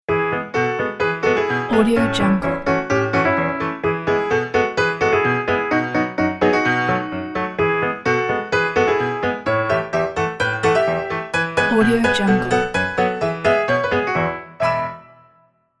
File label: playful piano logo